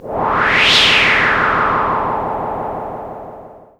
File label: Casio MT-600 Typhoon Sound